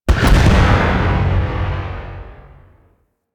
Enemy Ship Destroyed Explosion; Warframe; Vehicles; RailJack; Corpus

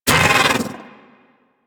bug vocal pain

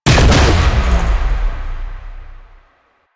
Corpus Projectile Explosion; Warframe; Jade Shadows; Ascension

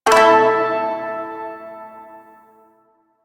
Shawzin Chromatic Chord; Music; Chords

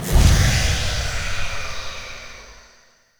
Survival Corrupted Aura Inner Spawn; Warframe; Zariman

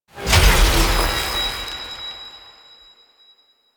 Cursed Chest Unlock; Warframe; Duviri; Cave Combat Encounter

